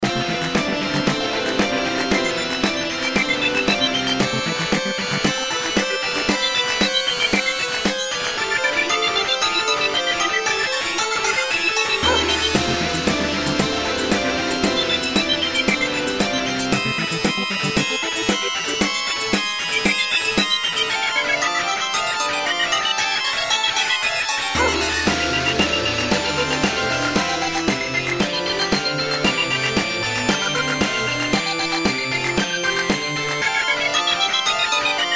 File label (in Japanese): シンセサイザー，パイプオルガン，ロックギター，エレキギター，エレキベース，マラカス，クラベス，シェーカー，アゴゴー，ドラ，クラップ，ドラム，ホーン，いぬの鳴き声，ビーム，笑い声など; カオス; 第56回 東京都中学校 音楽創作コンクール; 3年 A2 授業部門 歌曲以外